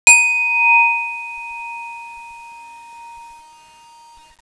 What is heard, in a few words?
chime